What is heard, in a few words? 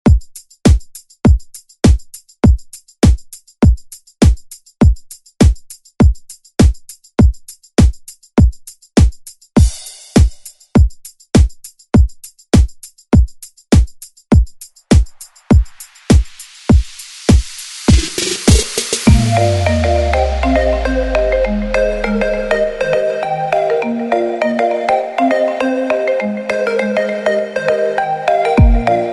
ReDrum; DJ Mhark